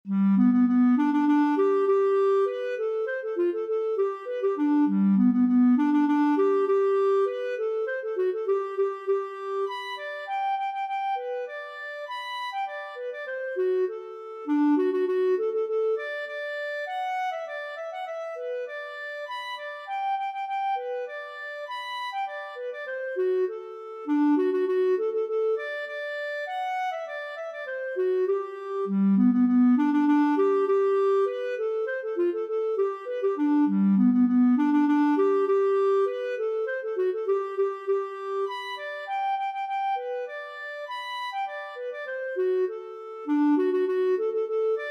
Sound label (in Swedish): Polkett; Rassel Olles